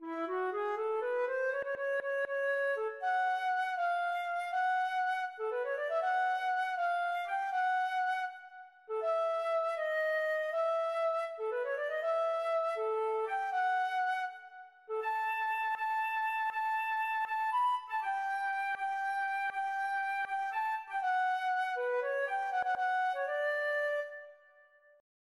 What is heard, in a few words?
underwater, feadóg